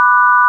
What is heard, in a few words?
sine; 音の波形と分析